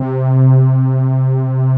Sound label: SMOOTH SYN, synths; Pro-Rec - Synthsonic